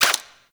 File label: Necramech Repair Drone Idle Short; Warframe